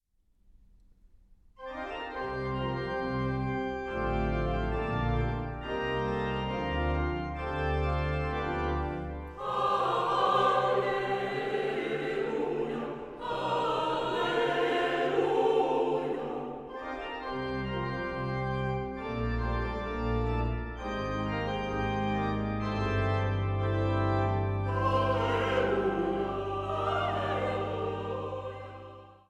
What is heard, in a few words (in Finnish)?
Halleluja; Valon lähde kanttorien cd